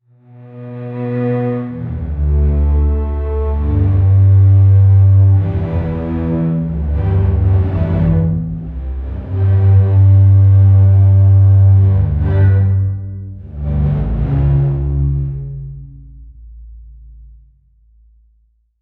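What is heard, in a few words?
orchestra classic low bass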